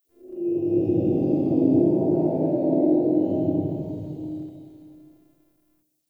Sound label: Exterior Distant Calls Angry; Ambience; Warframe; Duviri